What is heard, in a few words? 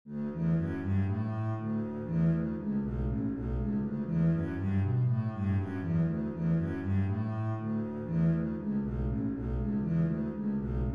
bass etude